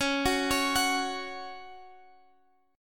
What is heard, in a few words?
F sharp 5th C sharp